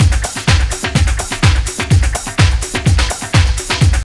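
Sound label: drum loop; X-Static Goldmine 3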